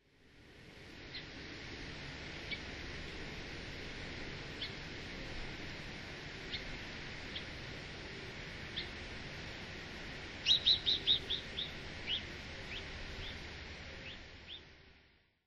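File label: Asian Rosy Finch; ikeshima; koe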